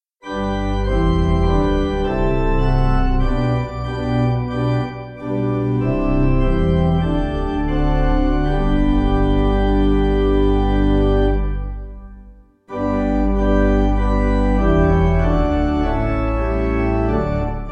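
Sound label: Pipe, Pipe Organ; St Victor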